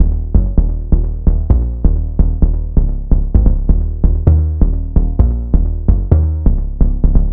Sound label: Techno Melodic Techno